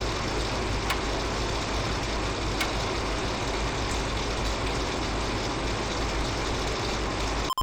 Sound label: EVP Clicks